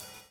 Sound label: DOWN, DOWN KIT 78